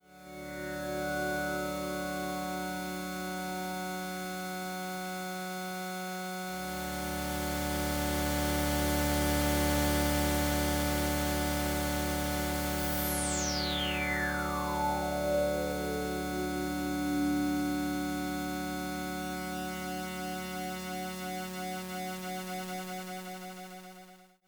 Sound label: D drone